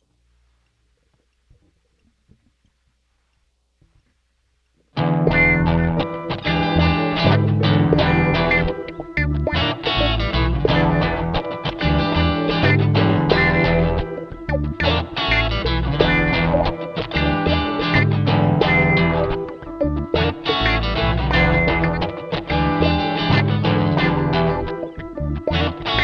Funk Right Out; admiralbob77

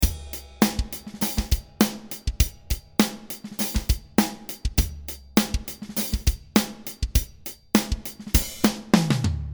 beat

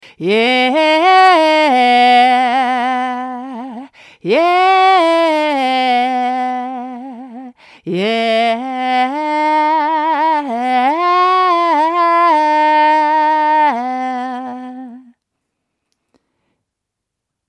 Yeah vocal sample

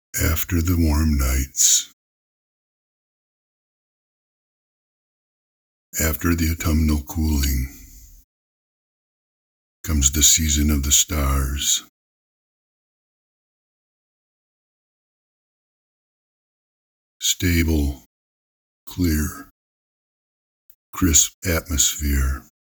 Vox DRY

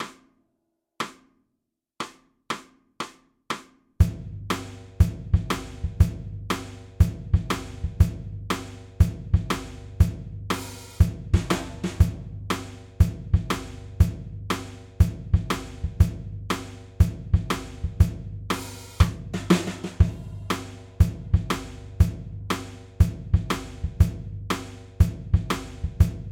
Drums 120 BPM

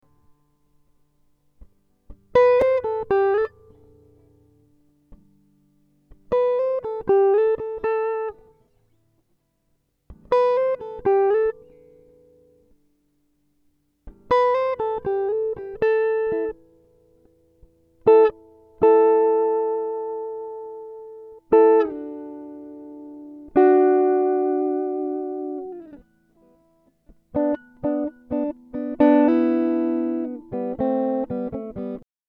Am60 GTR impro

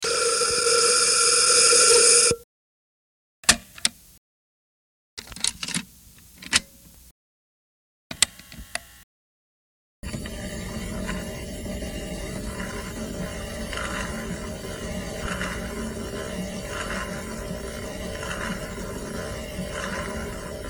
Sound Effects

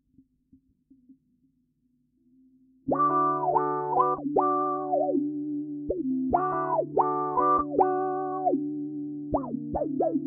D140 GT Riff Trash

